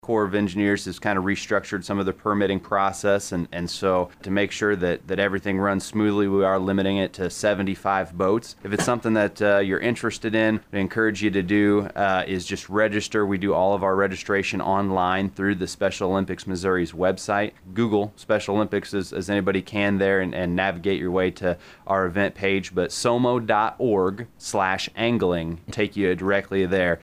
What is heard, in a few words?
bass